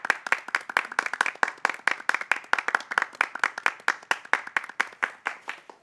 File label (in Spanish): Palmas